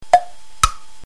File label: ticktock; Dieppe